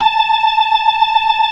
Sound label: FAST VIB, ORGAN, TREMOLO VIB